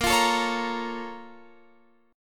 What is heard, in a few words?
Bb Minor Major 7th, Guitar, Open F; strummed